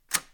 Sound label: Slide Sound; AutoHand; EmergencyEvacuation